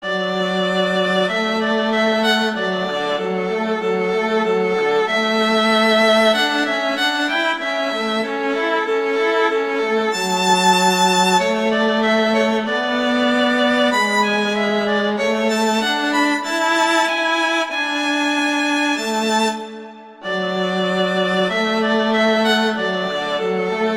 Duet; Mozart; Duets; Vla

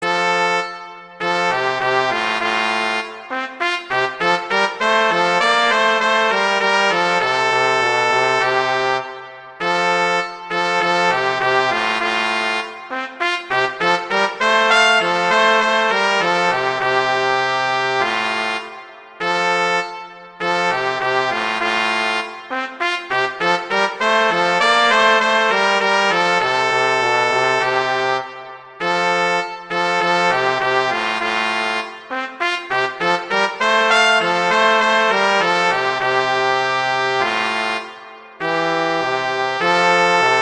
Duet; Mozart; Duets

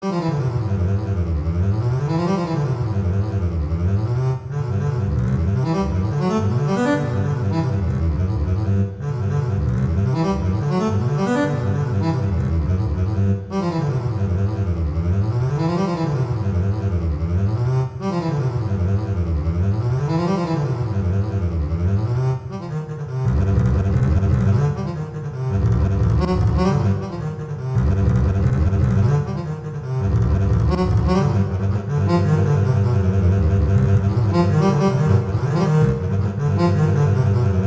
Waltz; Dragonetti; Waltzes

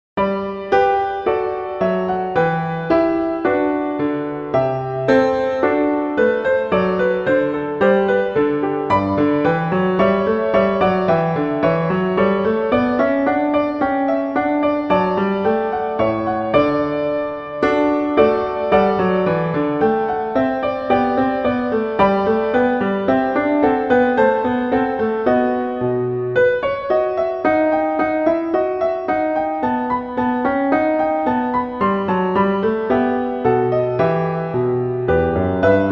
Gavotte; Bach; French Suites

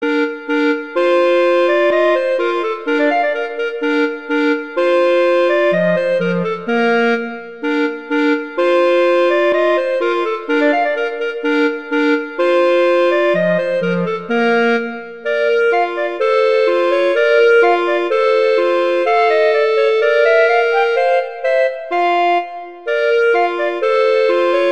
Duet; Mozart Duets

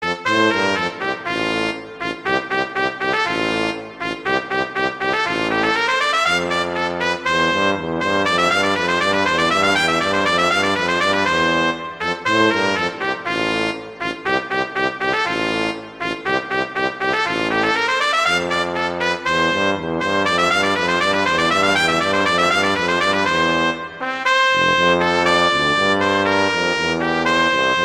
Duet; Mozart; Duets

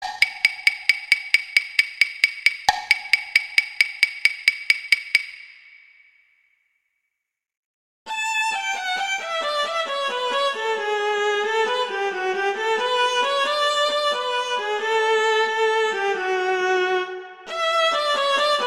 Gigue Cello; Pachelbel; Canon; Vla Vc

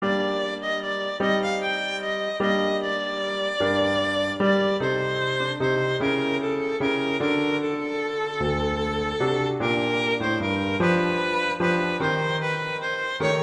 Larghetto; Tartini; Devil

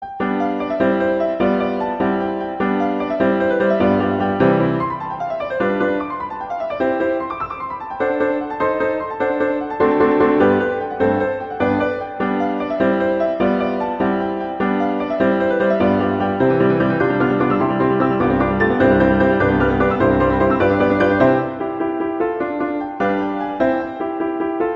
Allegro; Clementi; Duettino

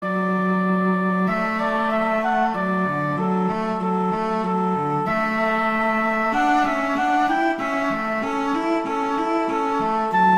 Duet; Mozart; Duets

